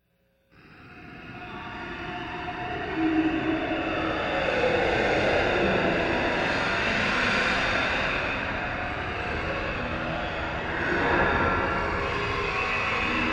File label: Torture Chamber Horror Ambience Chains Metal Groans Voices; Scary Soundscape